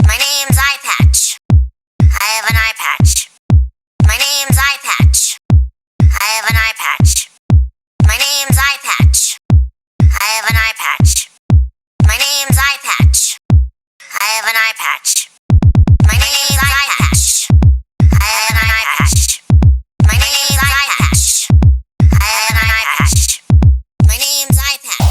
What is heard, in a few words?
Nature Sounds; Pony Music Archive